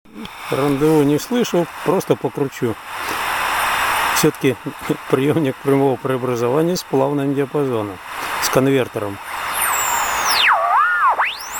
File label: VFO 14mHz